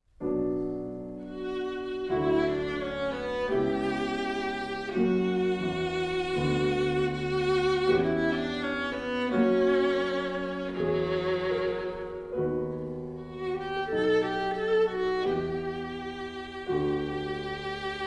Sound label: viola and piano; Toccata